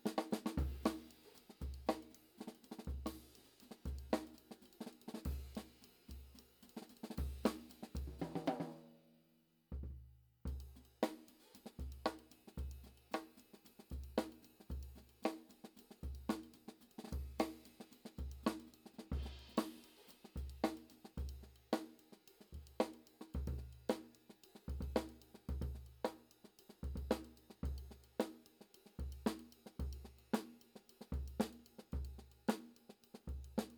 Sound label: Kit